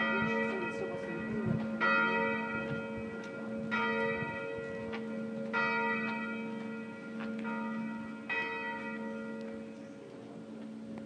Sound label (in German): Iphofen Kirchenglocke